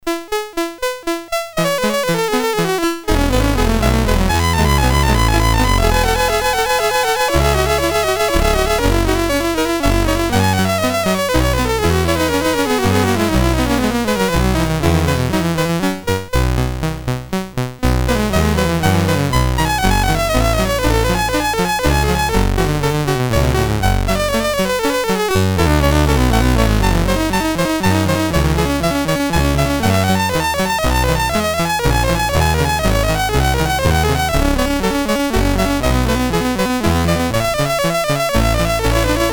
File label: Invention in F, Commodore, MOS6581; MOS6581R3